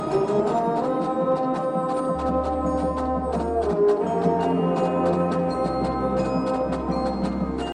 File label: Baritone